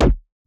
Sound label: Perc, Percussion; FLPRO